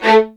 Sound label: A3, LOUD VIOLINS; Q Up Arts; Denny Jaeger Master Violin Library